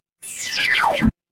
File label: ui undo